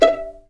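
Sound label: E5 pizzicato mezzo forte right, violins; East West; The Ultimate String Collection; VLNS PIZZ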